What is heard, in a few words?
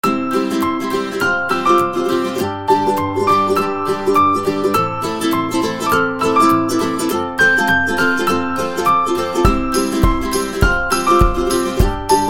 Happy and Funny Folk, happy music